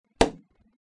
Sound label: hit